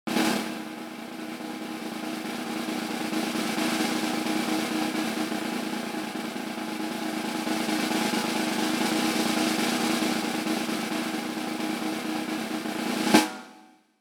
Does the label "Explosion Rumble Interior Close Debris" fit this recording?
No